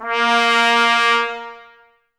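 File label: brass trumpets A#; 90 s Sample CDs; Miroslav Vitous; Woodwind Brass Ensemble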